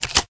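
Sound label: shotgun reload loop fast shell in; reloads; iw8; romeo870; gmod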